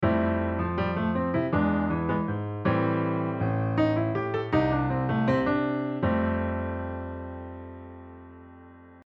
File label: oshare rhythm